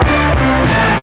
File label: GRUNGE, STABS